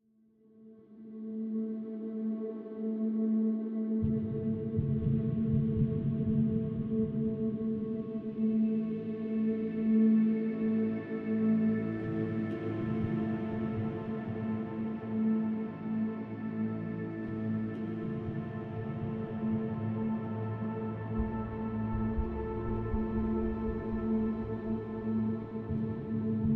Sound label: Exploration